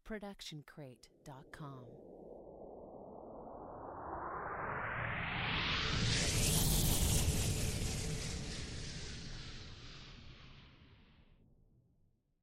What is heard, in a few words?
Cinematic Riser